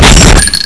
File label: auto shotgun